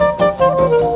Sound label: a little rhythm